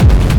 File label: Hard Drum Kick